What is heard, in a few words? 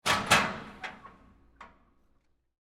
Locker Open, Sports, Locker, Door, Open; Sports, Locker, Door, Open, Slow; Miscellaneous Sport Sound Effects